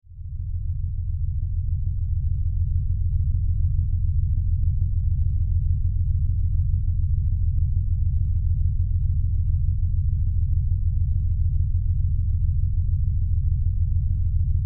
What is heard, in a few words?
Science Fiction Power Drone Spaceship Hallway; Sound Design